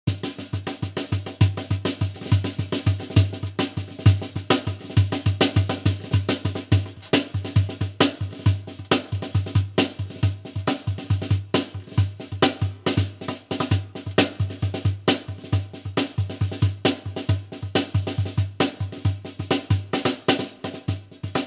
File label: cajon